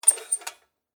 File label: Lantern Squeak, Metal; Foley; Squeaks; Objects; BlastWave